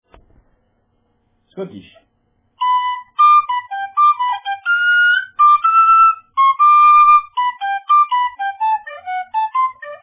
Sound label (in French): xote scottish